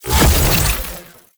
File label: Rifle Alt Shot, Weapons; Warframe; Tenno; Gyre